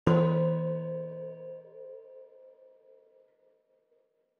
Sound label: ambient